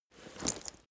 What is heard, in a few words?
leather metal movement soft single